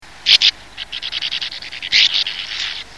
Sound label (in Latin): Riparia riparia